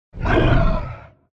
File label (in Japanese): doubutu